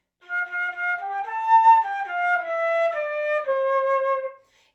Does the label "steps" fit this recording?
No